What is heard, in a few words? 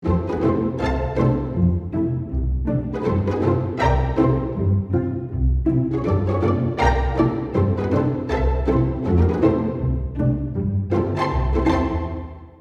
Symphonic, pizz; Spitfire